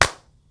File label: CLAPS; CLAPS+SNIPS